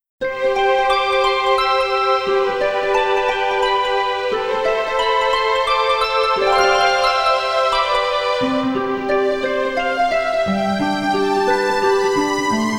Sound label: Instrumental